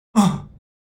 male grunt soft; Butterfinger Video Sound Effects

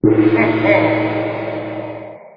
evil gong

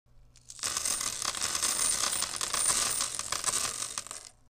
coins

